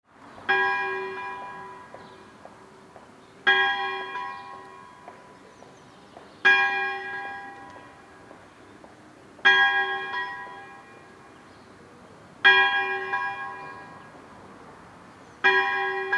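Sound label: church bell small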